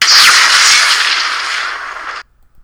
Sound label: spell